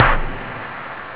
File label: dzing; OctaMED SoundStudio V1